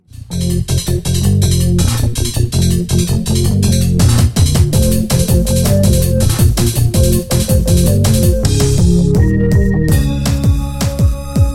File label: drum and bass; soul studios demo